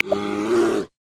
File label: Asian Black Bear, South West Mountain; Xiaomi Redmi-8A